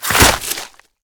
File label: flesh, smallclaw combat abilities